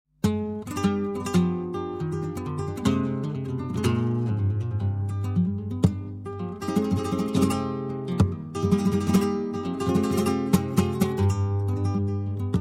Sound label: solea, flamenco